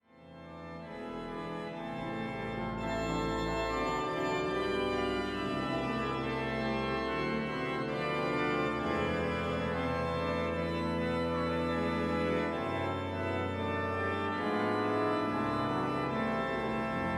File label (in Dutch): Hinsz-orgel klankvoorbeeld; Appingedam